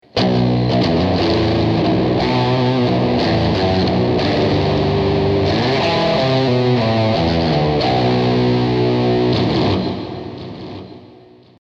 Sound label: Booster; v-wah